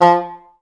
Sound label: STAC F2, Orchestra; Best Service; Peter Siedlaczek's Advanced Orchestra